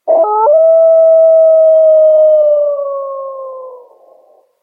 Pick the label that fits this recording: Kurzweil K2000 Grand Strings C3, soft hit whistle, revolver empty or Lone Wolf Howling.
Lone Wolf Howling